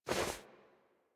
Movement Foley; Warframe; Wisp

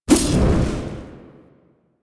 Sticky Bomb Throw; Grineer; Veilbreaker; Vor

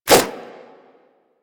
Nunchuck Whoosh E, Infested, Weapons; Warframe

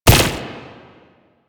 Archgun Projectile Explode; Warframe; Archwing

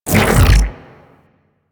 Shield Pass Through; Enemies; Weapons; Warframe; Corpus; Arachnoid Camper

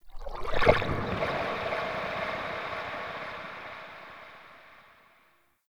Trippy Lap, Ambience; Soul Plane

